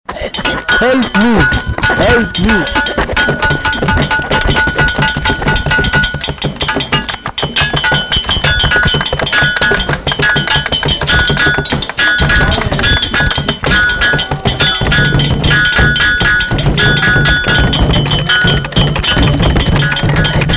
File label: remix